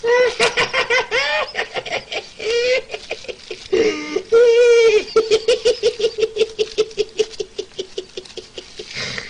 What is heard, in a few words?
korean guy laughing at his food